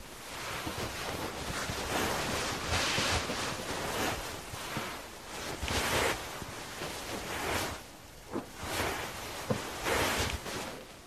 Player Rotation; miside demo windows gamerip 2023

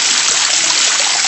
WATER THROW

